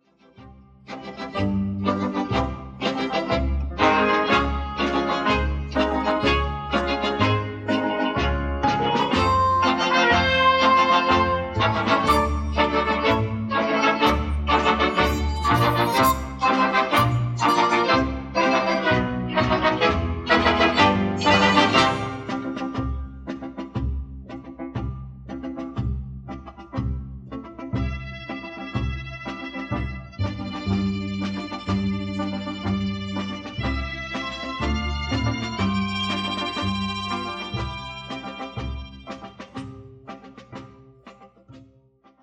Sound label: instrumental backing isolated